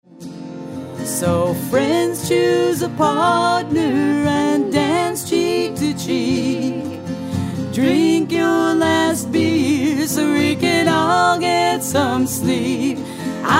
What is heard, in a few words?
bedtime waltz